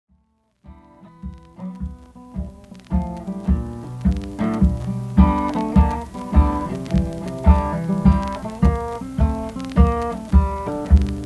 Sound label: mean mother blues